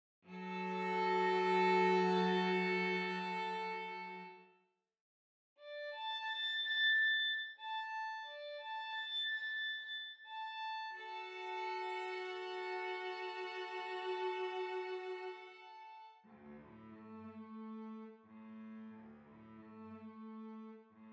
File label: lullaby alternating